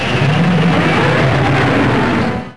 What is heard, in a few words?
pain; alienarena; war